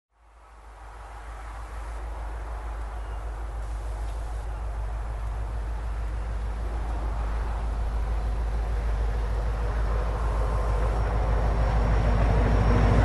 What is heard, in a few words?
train by and long wait